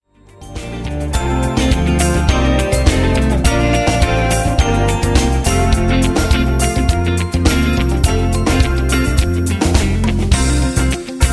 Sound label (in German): Friede auf Erden playback